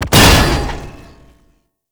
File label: tank swing attack impact; Enemies; Abilities; Lasrian Tank; Warframe